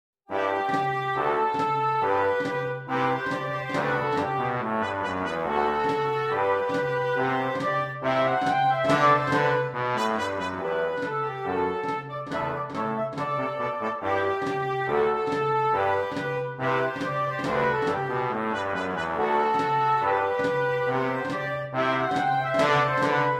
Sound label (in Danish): Dansk Grenader Marsch, Hoboistkorps